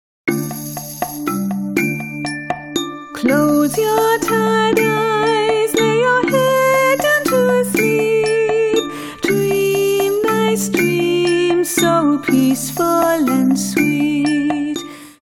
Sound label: Dream Song